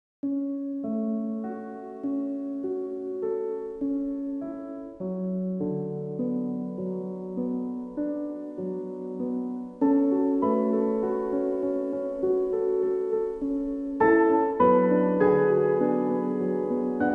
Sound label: Piano Music